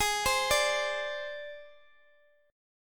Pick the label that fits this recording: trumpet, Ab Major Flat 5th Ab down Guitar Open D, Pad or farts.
Ab Major Flat 5th Ab down Guitar Open D